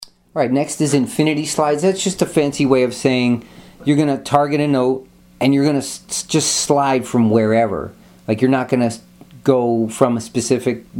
expressive elements infinity slides

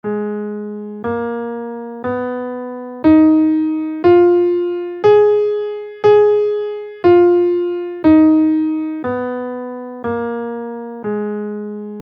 kumoi scale in Gm

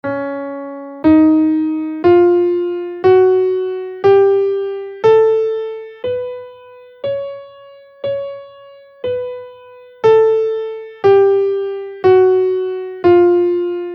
major locrian scale in Db, guitar

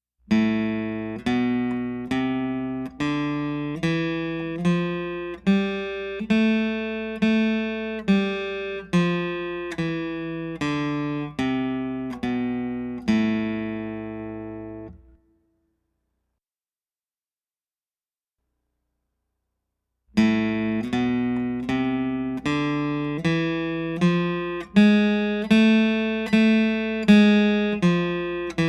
A Minor Scales Natural Harmonic Melodic

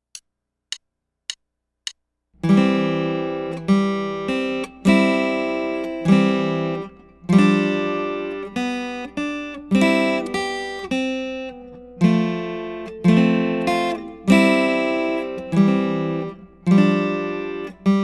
Chord Walk

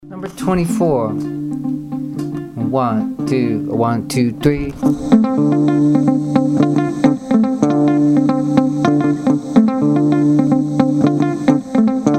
mozambique patterns

